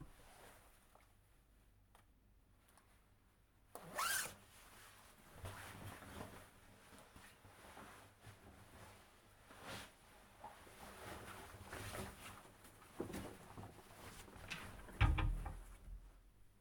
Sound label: Placing Coat To Coat Rack